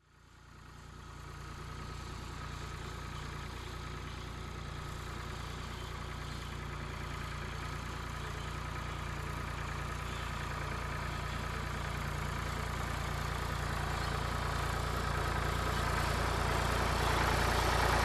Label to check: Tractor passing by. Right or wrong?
Right